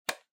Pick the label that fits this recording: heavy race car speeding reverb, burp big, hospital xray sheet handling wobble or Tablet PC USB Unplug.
Tablet PC USB Unplug